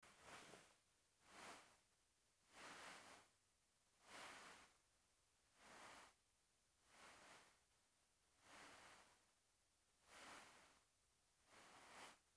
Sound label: cloth; クッション・枕で寝返りや顔をうずめる音